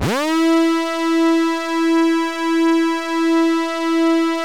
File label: ARP Odyssey E5, PORTAMENTO; Solo-Synths; Giga Samples Collection